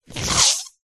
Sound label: cartoon squash deflate; zapsplat